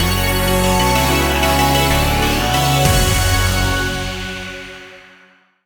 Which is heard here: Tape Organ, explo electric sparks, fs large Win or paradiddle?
fs large Win